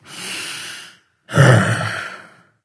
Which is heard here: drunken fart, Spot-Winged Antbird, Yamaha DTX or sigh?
sigh